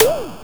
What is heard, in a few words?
pop; sonic the hedgehog